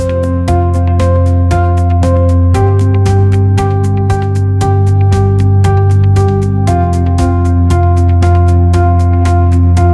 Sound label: corporate sting; sound effects